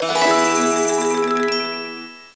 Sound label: reward; Fisher Price Ready for School Kindergarten